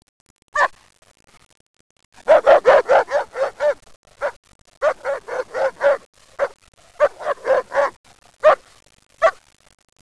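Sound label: dog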